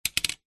leisure toy car metal set down on wheels wood surface